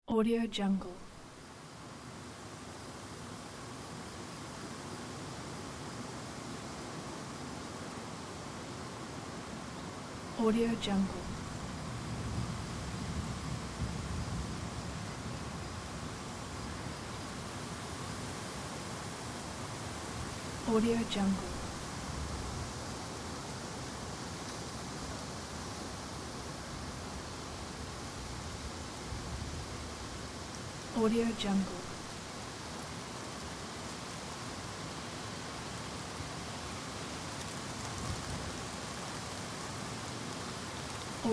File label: windy and rainy night with far thunder